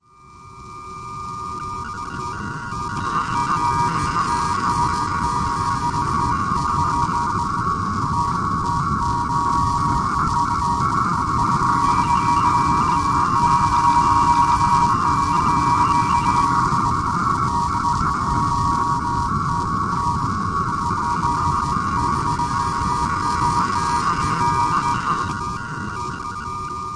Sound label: scanner radio long flyby; Radio FM synth type stuff